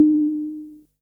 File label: beep, Synths; Notes